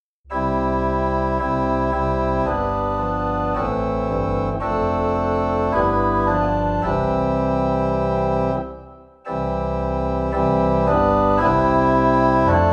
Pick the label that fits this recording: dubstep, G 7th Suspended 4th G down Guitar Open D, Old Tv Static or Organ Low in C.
Organ Low in C